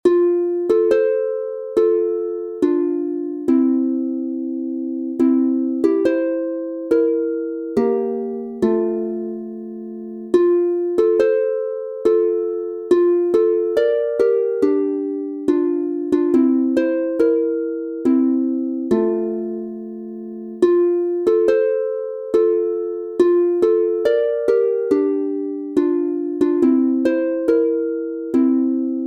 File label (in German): Bayerische Volkslieder, F-Dur, instr, Harfe; Kummt schoei hoimli die Nacht